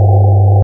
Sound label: Bass; Revista CD Expert 50